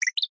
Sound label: science fiction robot chirp beep very short slightly cartoonish